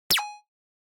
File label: Percussive Accent Timbre; 打楽器アクセント